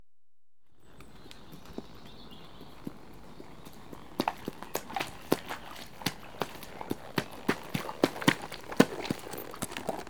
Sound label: One Unshod Horse Walks Through Pu; Horses & Dogs; BBC Sound Effects Library